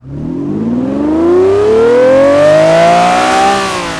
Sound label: first, vehicles; diablo